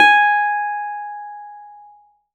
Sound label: G#4, Keyboards; Model-C; Ableton Foundation FreePacks